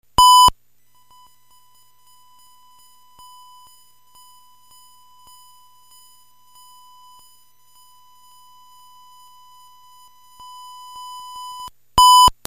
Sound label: square, apu mixer recordings; nes; sabicom